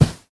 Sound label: Carpet one